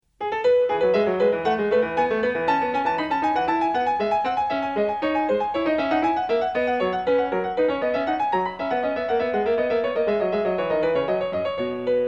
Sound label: bourree; bwv 817; French Suite 6; BACH; FRENCH SUITES